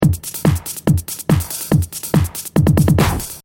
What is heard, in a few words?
Techno-Trance Drum Loop 142 BPM; Royalty Free Drum Loops; LYN117 pack of techno-trance 142 BPM